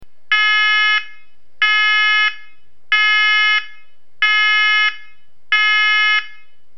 INTERMITTENTE 420HZ

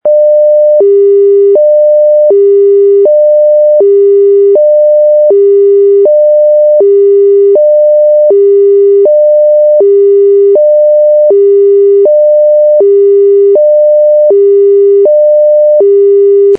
Low Freq 2 tone; PAGASYS

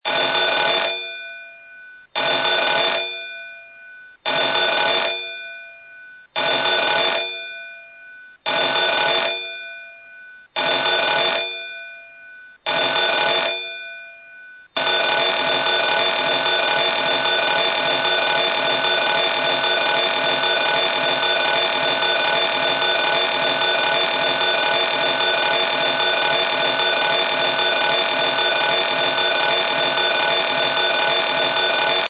Bell 7x SHORT 1x LONG; IMO PAPA BELL; PAGASYS

